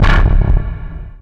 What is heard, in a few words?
Very deep dist. Bass